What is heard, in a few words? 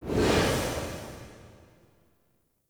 Energy Spike End; Powers